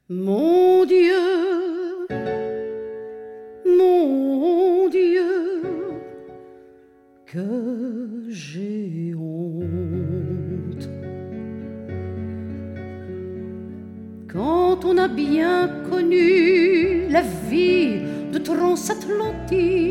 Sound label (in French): Voix chantee, THÉÂTRE MUSICAL, Voix chantée, personnage